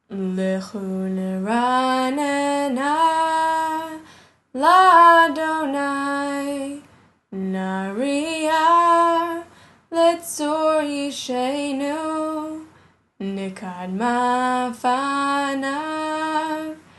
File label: psalm singing only